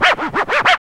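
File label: DJ SCRATCH; AMG - Now CD-ROM (Roland); B.2.B. Fun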